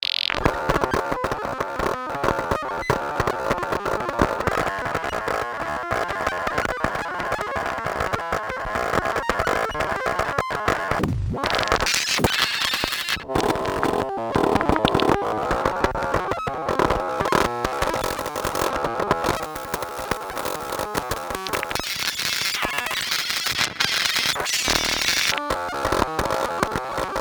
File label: huh